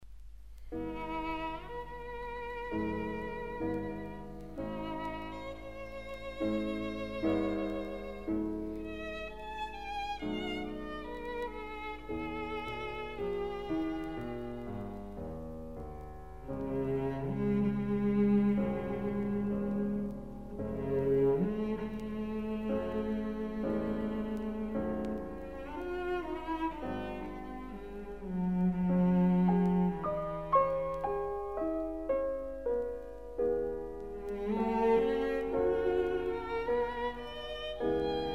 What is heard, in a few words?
piano trio; godard